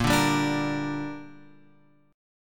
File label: Bb 6th Bb, Guitar Open F; Bb6 strummed